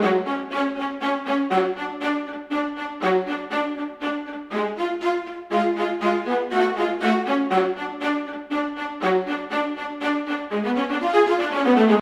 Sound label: viola; виола